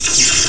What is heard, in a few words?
electricity Sound; 22,050 sample rate; 0.06 seconds; Ferazel's Wand Demo